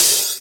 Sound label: Open HH, Cymbals